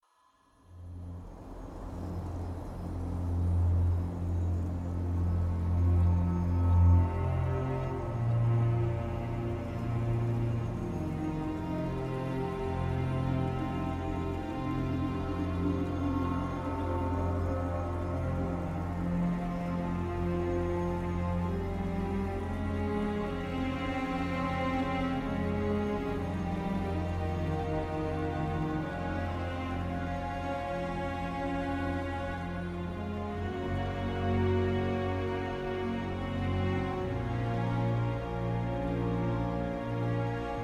music inqueue loop; jade

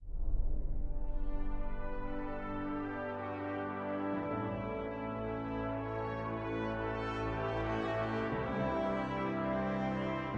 music home main; activity center

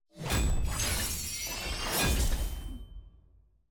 celebrate ranked promote to platinum; leagues

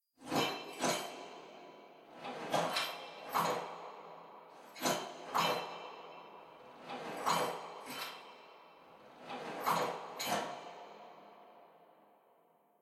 workout squat

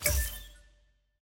sfx ui save button clicked; rgm augments

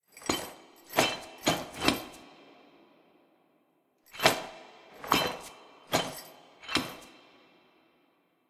walk sneak and peek

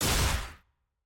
aah; naafiri

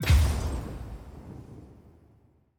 lobby notif player kicked; parties

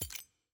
button arrow back click; champselect; lol champion details

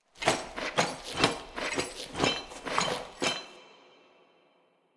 walk moonwalk

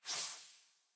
ranked ui circle meter fill small; postgame

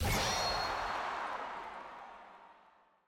status hype

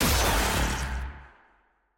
sfx finisher; samira

